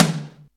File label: small reverb tom drum d key, Royality free tom drum tuned to the D note. Loudest frequency: 2213